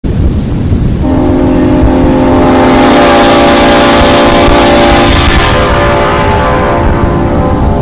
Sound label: Leslie S5A Horn